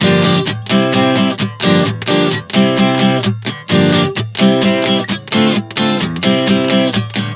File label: Gm-C-Dm7 130 bpm, acoustic guitar chord progressions